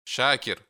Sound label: shaker; Шакир; Шакир شاكر, «благодарный, признательный»